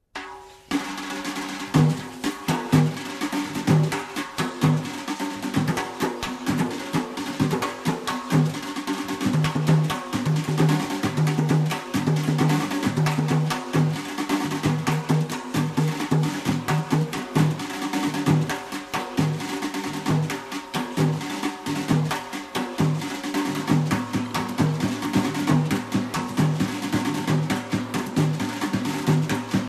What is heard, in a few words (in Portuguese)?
Toques de tambores